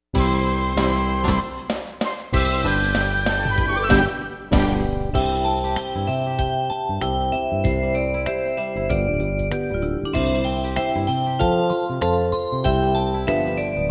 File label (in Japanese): Takibi inst